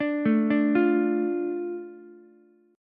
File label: D Minor D Ukulele; arpeggiated; JGuitar